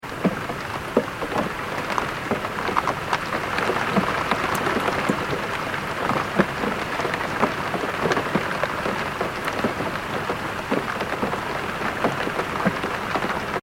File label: weather rain med internal window, Rain On Window (Internal Recording); Rain ( Medium ) Hitting Window With Drips ( Internal Recording )